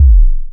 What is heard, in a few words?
Perc Hit, Noise